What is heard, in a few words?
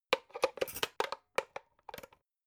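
electric whisk patch handle short, kitchen; haus-garten; kueche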